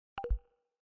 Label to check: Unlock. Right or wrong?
Right